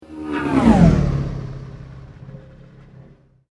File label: Sweep Mechanical Slow